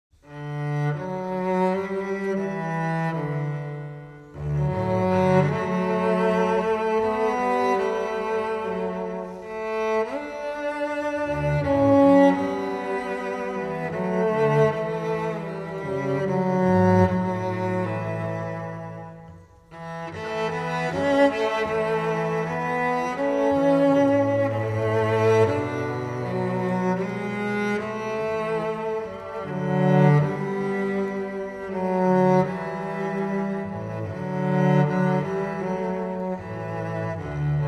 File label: Cello; AmbienceCello